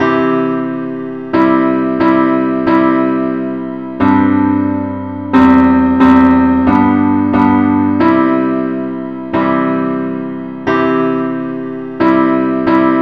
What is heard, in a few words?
parts only key C